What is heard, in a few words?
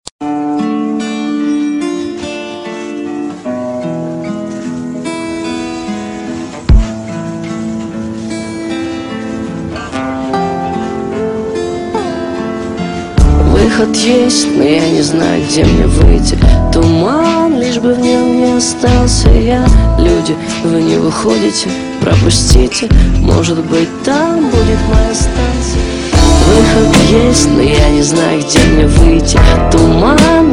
Ringtone